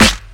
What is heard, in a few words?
original rap steel snare drum f sharp key